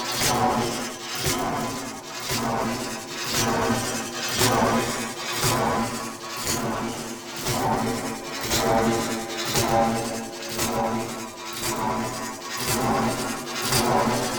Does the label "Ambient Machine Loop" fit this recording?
Yes